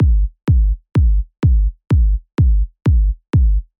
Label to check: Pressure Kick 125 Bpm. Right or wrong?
Right